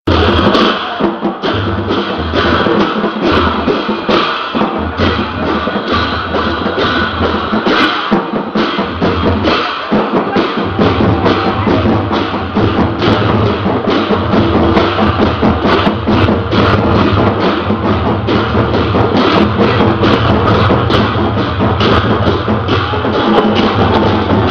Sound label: Music Of Nepal